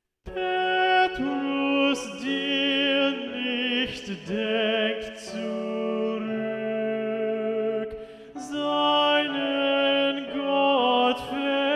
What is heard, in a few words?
Tenor